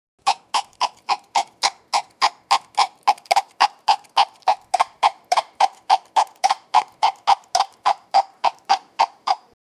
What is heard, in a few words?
horse sound, hooves